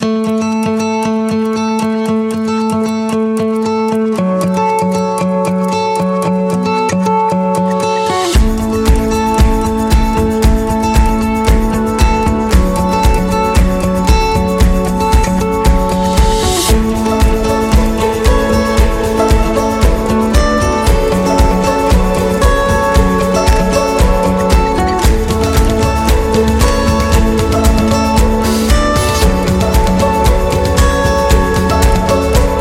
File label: Ankle Roll Music